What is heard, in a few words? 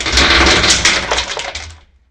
creak, ambient